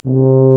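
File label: brass, TUBA C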